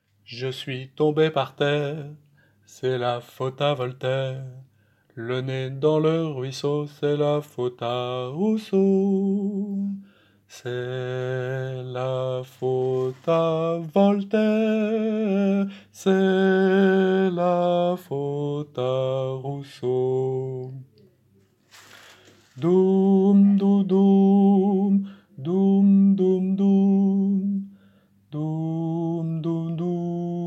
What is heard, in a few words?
alto